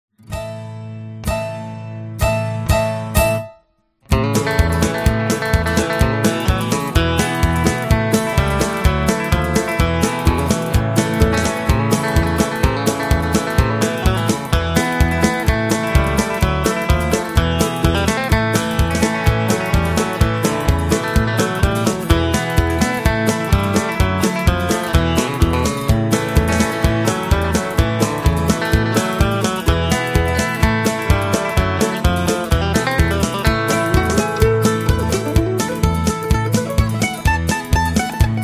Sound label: patter